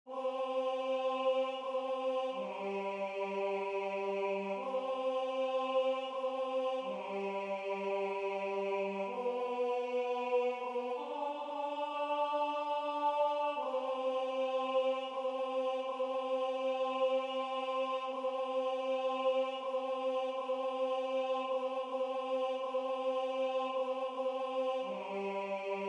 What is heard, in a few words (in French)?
Douce Nuit Tenor; Douce Nuit Grubert